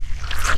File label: toothpaste; tuxpaint; magic